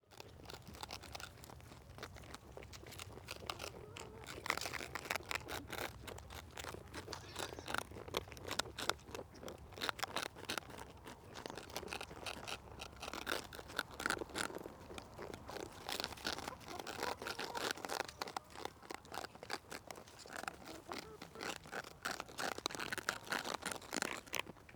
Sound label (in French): lapins; meuse